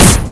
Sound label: Chain Gun Shot; Phoenix